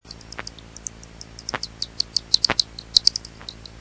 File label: bat